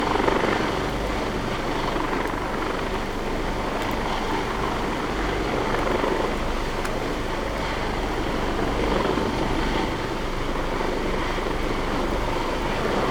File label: No Ship, helicopter